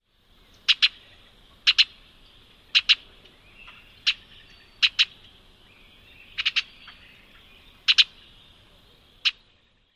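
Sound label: chit calls, orchard oriole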